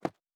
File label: Footstep Concrete Running